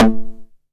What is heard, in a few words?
909 SD Tape Sat